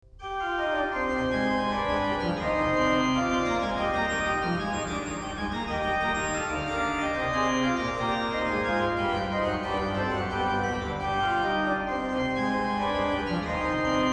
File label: partita vi, Great Organ Works; Bach; Great Organ Works 3